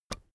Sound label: keyboard external space release soft, computer; kommunikation